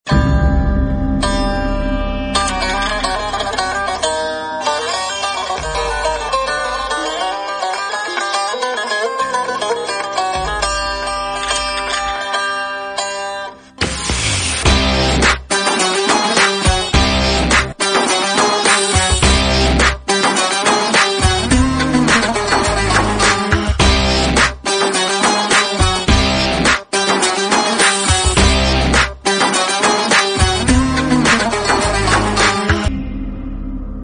electric veena; billa

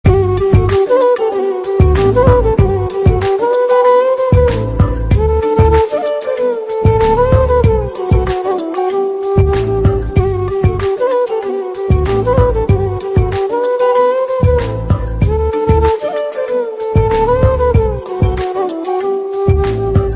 rocking flute beats

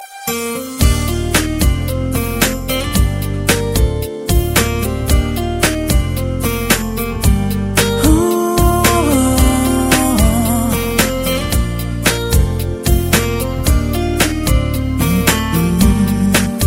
camerton

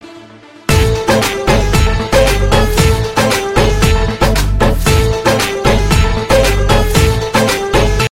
instrumental

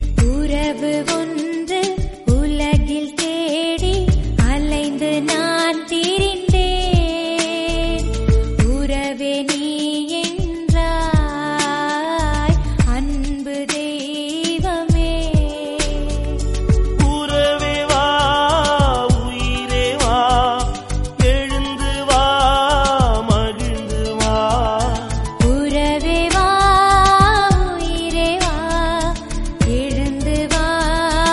devotional song

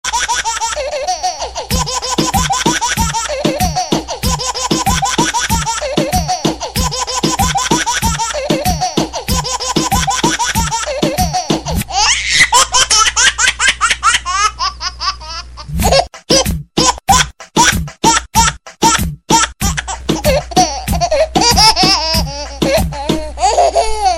disco

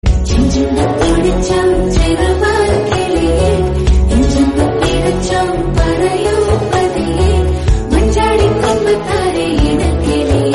ringtone

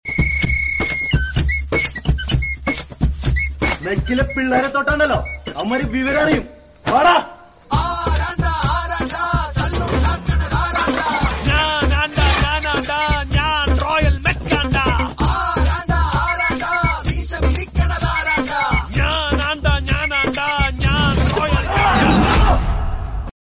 anthem

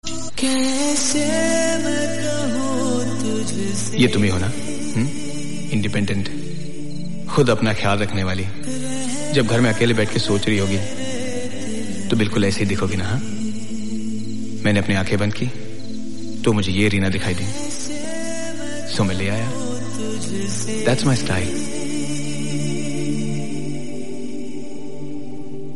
hindi ringtone

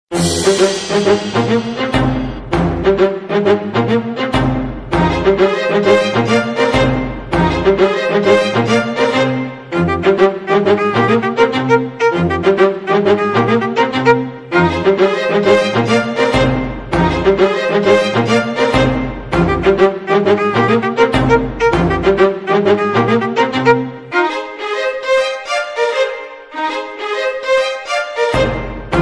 classic instrumental

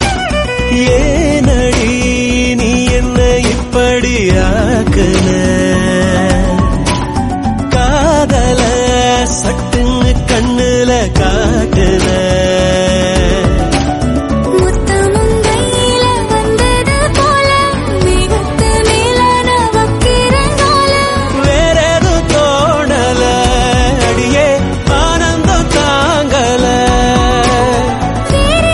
true lovely ringtone

